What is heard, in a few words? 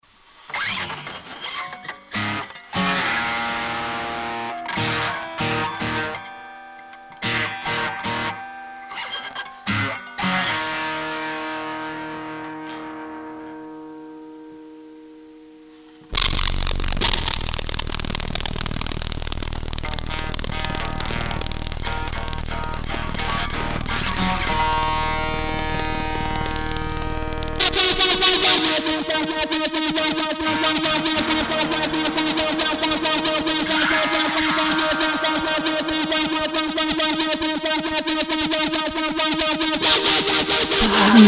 Distortion Level Soundcheck